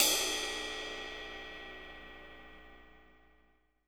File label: CYM, JAZZDRUM; Ueberschall; Drum & Bass Flash Trackz